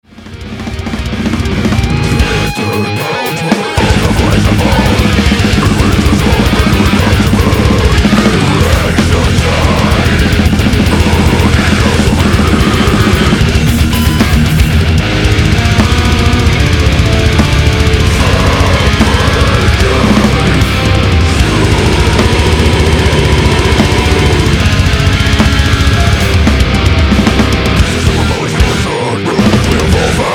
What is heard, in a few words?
metal